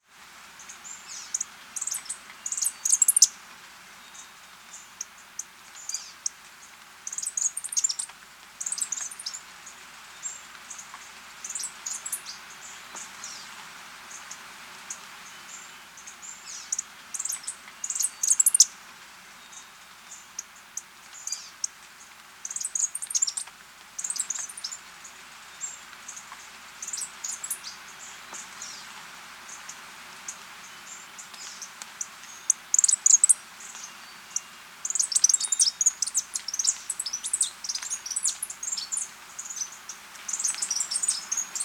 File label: Calls, Birds of the Costa Rican Cloud Forest